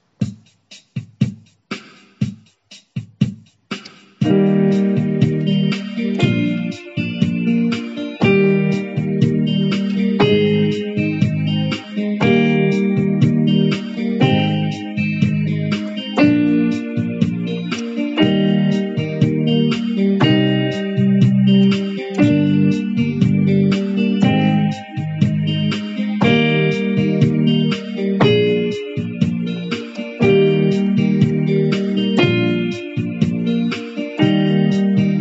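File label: E-flat major scale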